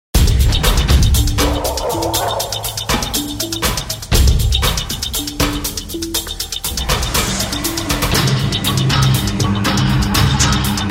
CHASSE TRANSITION

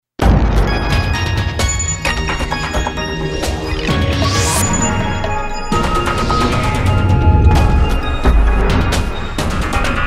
TRANSITION EXPEDITION

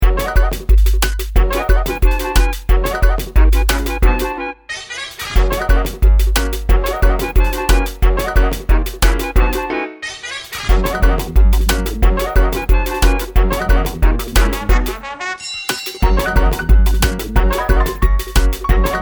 JAZZ LES CLAQUETTES

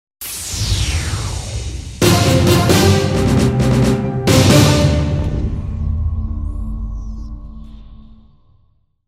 JINGLE

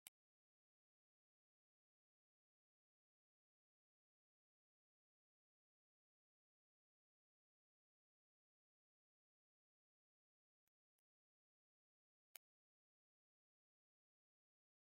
ARPEJES

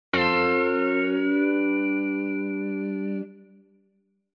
VIRGULE STEEL DOWN UP

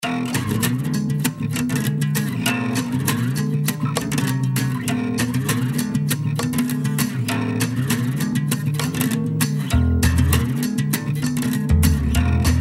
GUITARE FRET

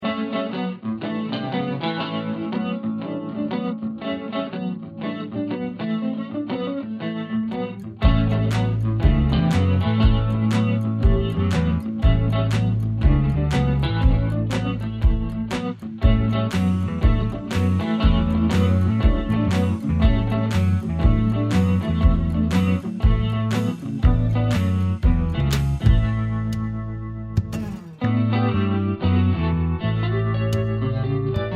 AMBIANCE CHAMPETRE

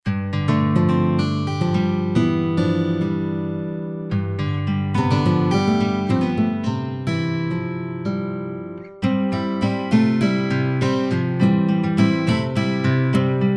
HAWAI GUITARE

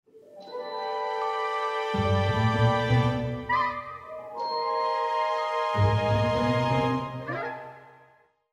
accentuations cogitation

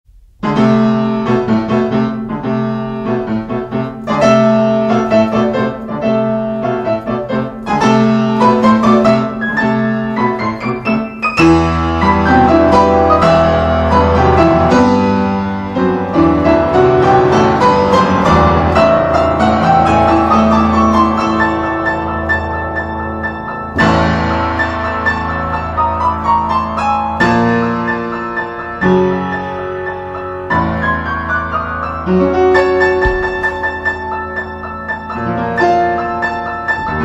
PRELUDE EN LA MINEUR

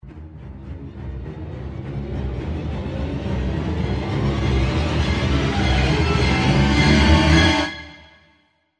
MONTEE PSYCHOSE

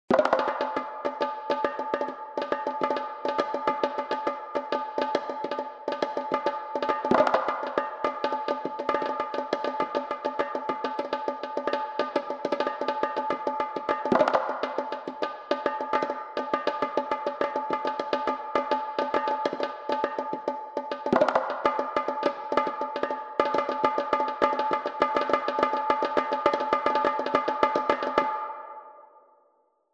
EQUILIBRE PERCS

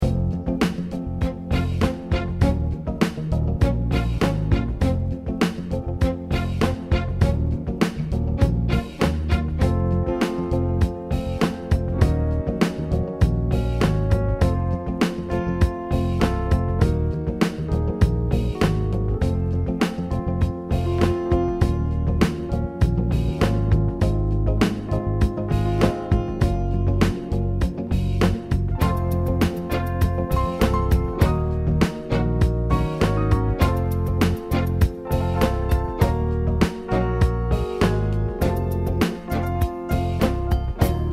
BALLADE

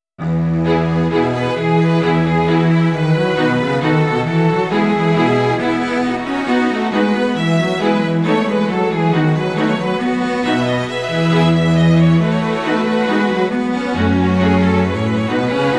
ALLEGRO QUATUOR

